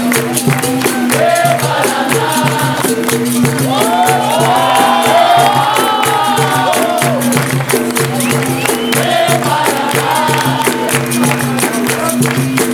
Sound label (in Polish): Capoeira muzyka odgłosy